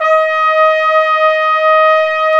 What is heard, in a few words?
WND FLG SO, Hybrid Winds Flugel & Sop